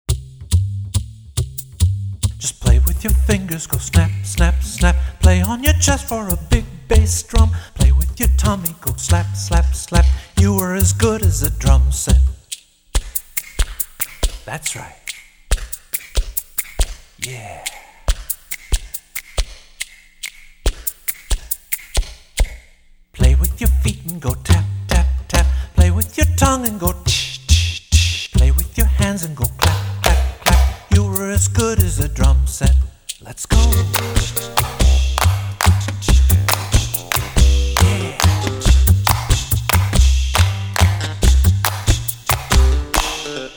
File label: DRUMSET SAMPLE; for kids only